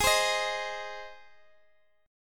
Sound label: G sharp Major G sharp Guitar DADGAD, chord